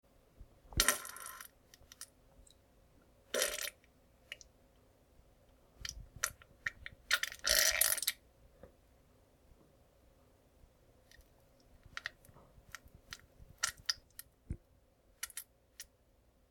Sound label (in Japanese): ぷぶ ぶぶ ぶりゅぅ ぷぶ, ふぇち音 下痢の音